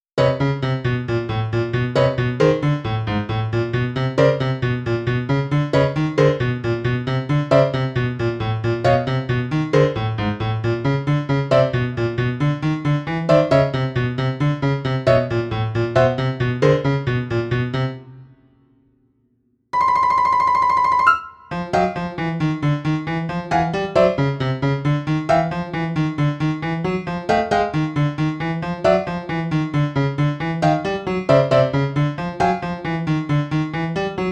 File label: puppy piano